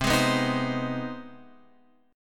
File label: C Minor Major 7th Sharp 5th C Guitar Open F; CmM7#5 strummed; JGuitar